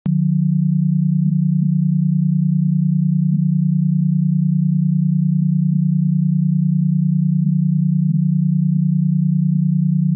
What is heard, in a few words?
Beta waves